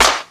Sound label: dirty gangsta steel snare drum sound d key